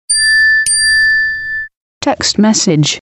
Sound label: Text message